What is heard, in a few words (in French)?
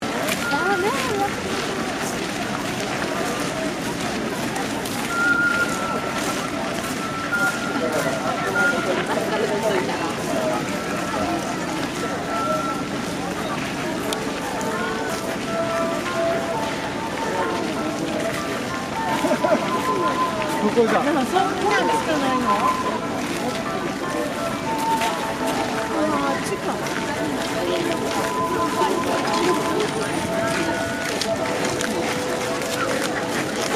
Sounds of Kagoshima Sanctuaire